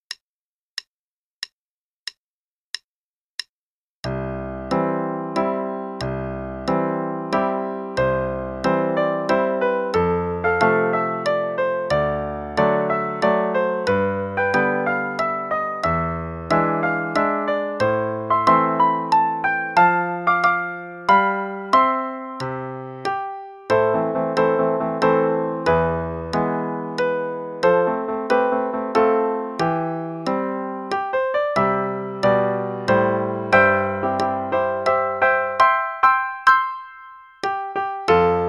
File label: We Wish You A Merry Christmas (Romantic, qn=92); Play-along; Faber 4